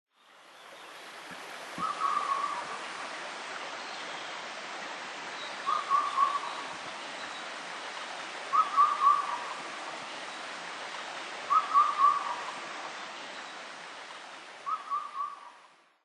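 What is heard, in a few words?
Indian cuckoo, tsushima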